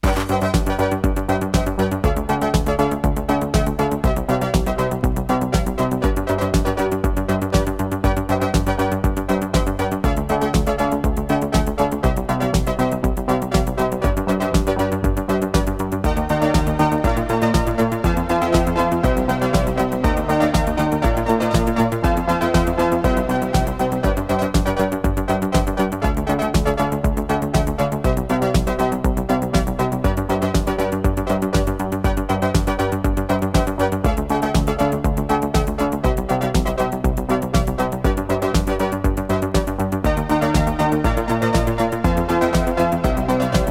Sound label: instrumental